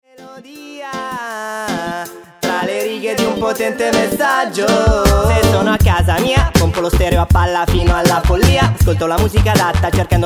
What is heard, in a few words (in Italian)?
melodia